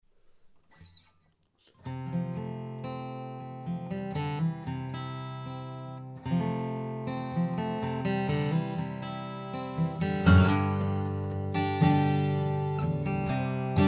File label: flatpick